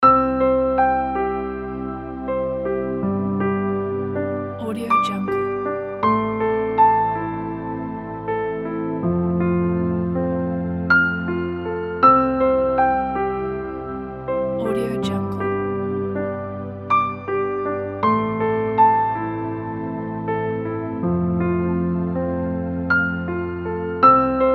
romantic piano and atmospheric background

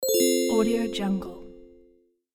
surprise

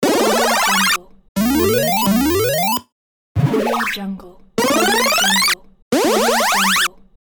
8bit power up pack

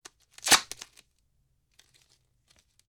band aid fast ripping from skin; prosoundfx

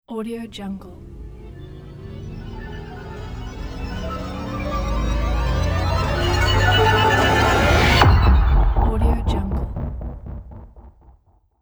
video game arcade logo

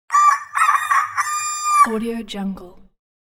rooster

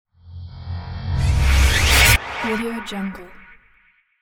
swoosh; magicsoundflower

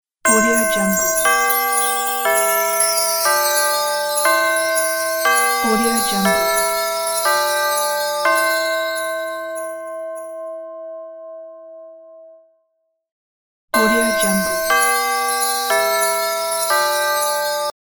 christmas bells

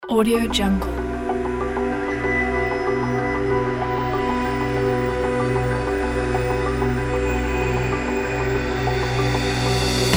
stealth action; monoalex

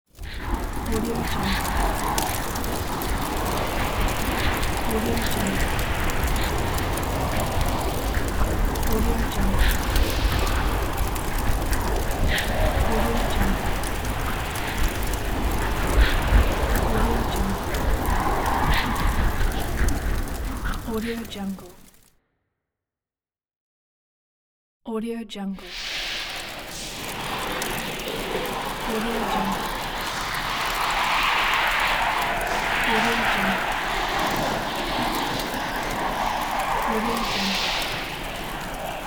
hell

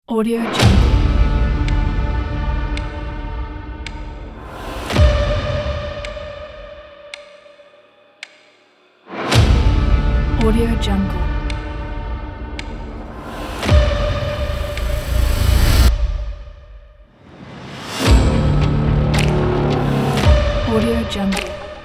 action cinematic dark trailer

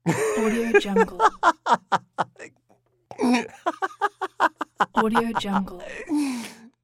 man laughs; valinsound

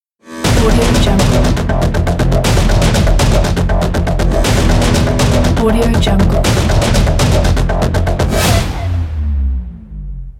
aspiration logo; mikekoylo

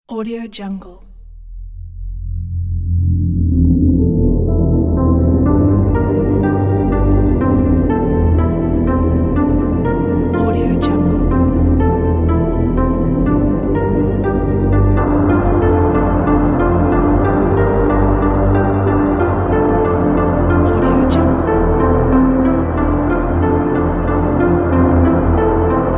keys soundscape

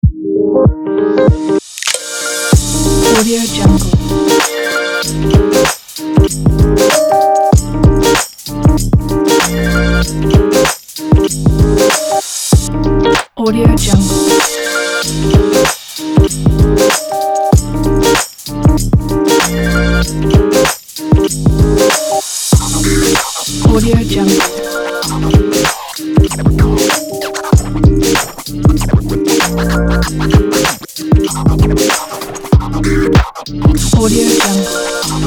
hip hop

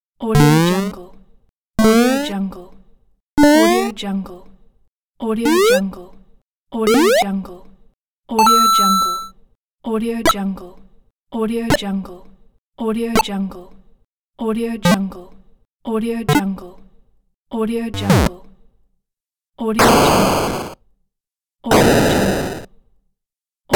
8-bit sound effects pack; evdokim-music

